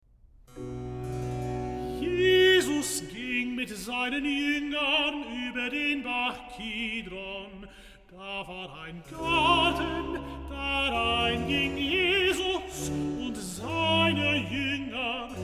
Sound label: Recitative; Bach, J S; Johannes-Passion, BWV 245, Pt. 1; II. Recitative. Jesus ging mit seinen Jungern ub